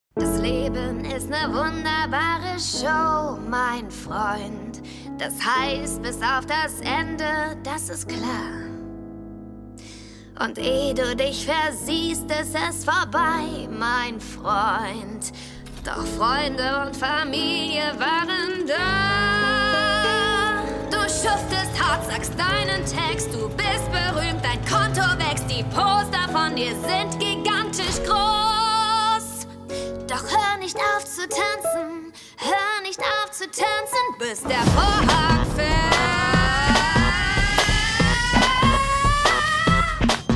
Gesang

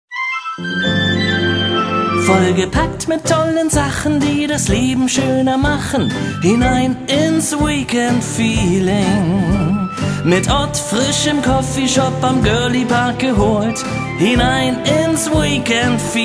Gesang; LOFT VOICES

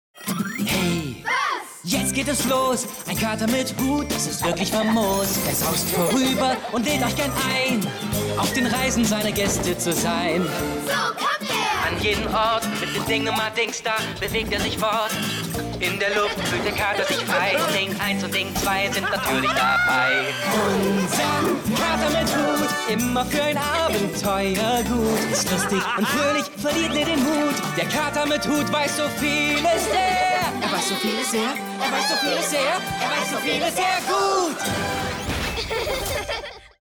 titelsong

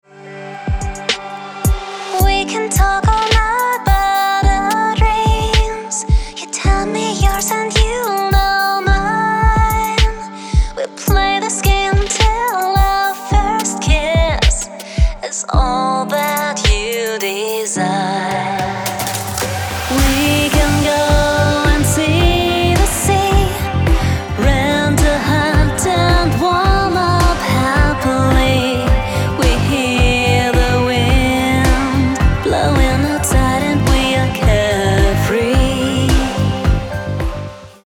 Gesang

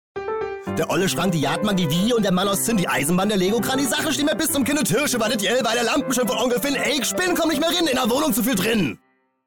berliner sprechgesang schnell; LOFT VOICES

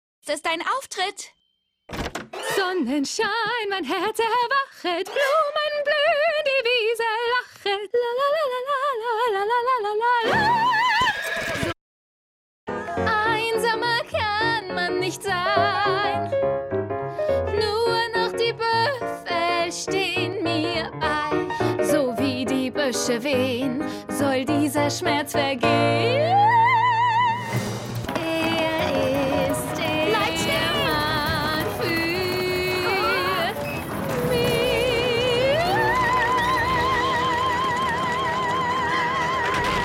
Gesang

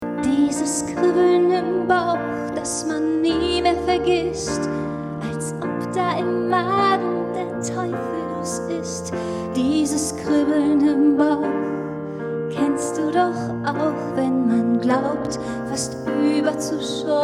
Gesang

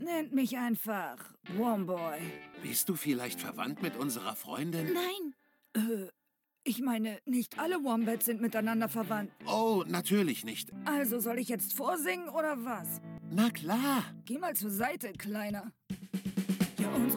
Song

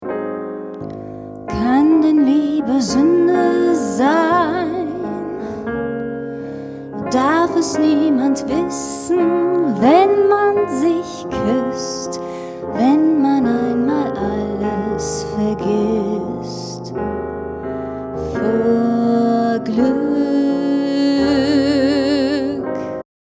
Gesang

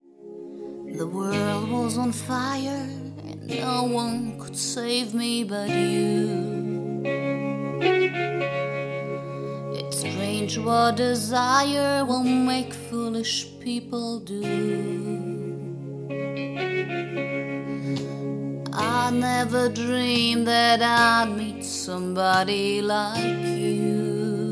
Gesang Ballade Cover

